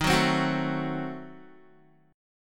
D sharp Diminished 7th D sharp Guitar; strummed

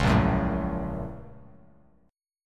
Bb Minor Major 7th Sharp 5th, Bass Standard; BbmM7#5; strummed; JGuitar

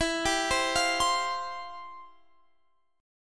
F Major 7th Suspended 2nd

